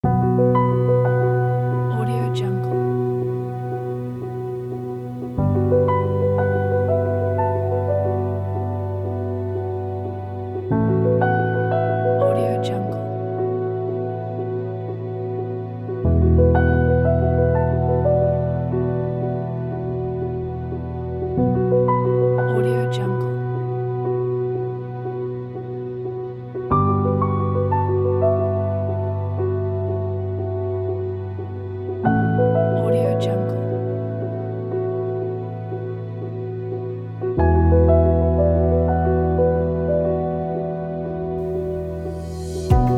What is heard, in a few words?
cinematic sad pack